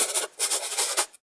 Writing

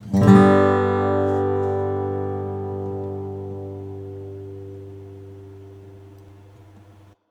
chord; beacon pines

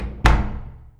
Door Close

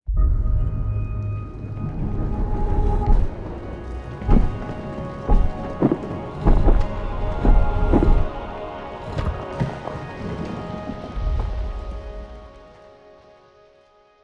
Jingles

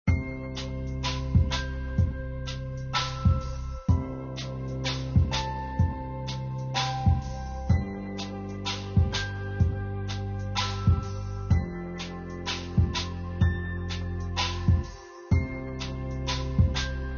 menu; Bubble Tanks Tower Defense 2

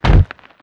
walk dirt; gamerip; shattered horizon 2009

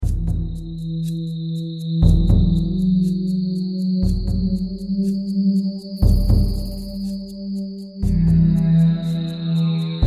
Ambient Loop; Battle Los Angeles

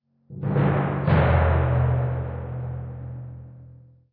Tymp Hits

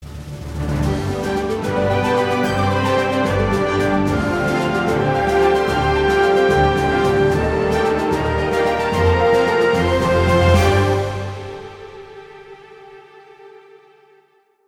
music Victory Theme

